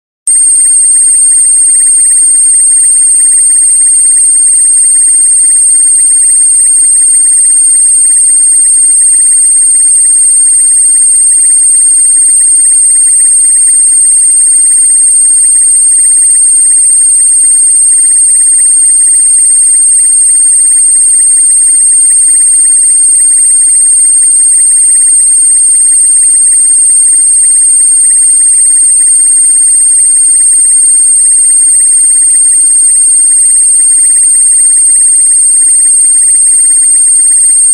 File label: Morning Noise Broadcast